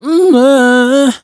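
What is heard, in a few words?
riff fluttery C sharp minor, Vocals, Dry Vocals; Raw Vocals; Slate Digital Omnivox Vocal Sample Pack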